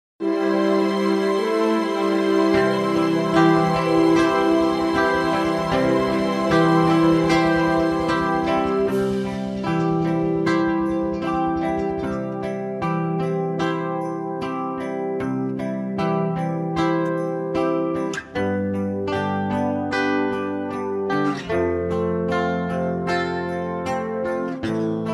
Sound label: No Backing Vocals, (C)